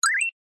science fiction robot chirp beep very short slightly cartoonish